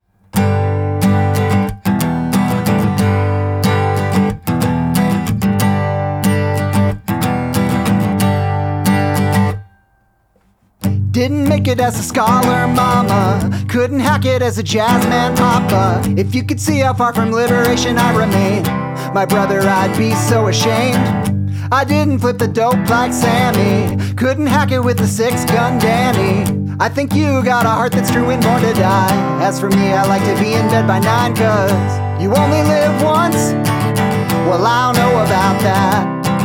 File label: Acoustic